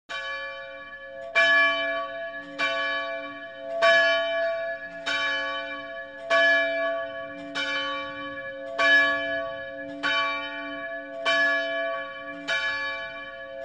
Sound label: Bells Chapel, bell towers